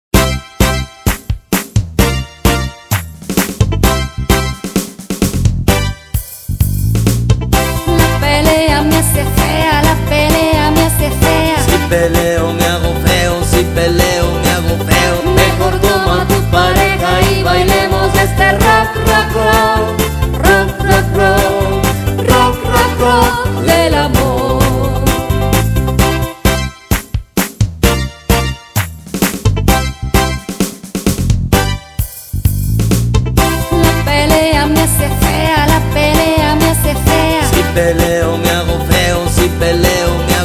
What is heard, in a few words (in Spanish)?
ROCK AMOR; Musica infantil - materiales de apoyo a la educacion inicial en iberoamerica